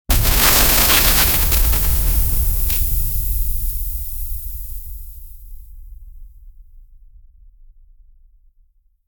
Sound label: celestial shatter